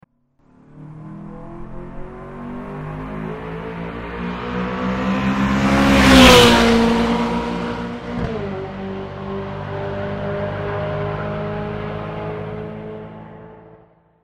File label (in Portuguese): ferrari passando